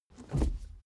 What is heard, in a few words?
suv push forward gear straight soft damped